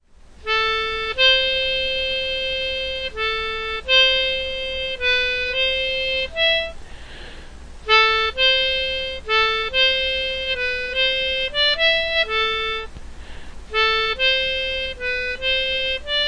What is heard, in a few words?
derpy melodica melody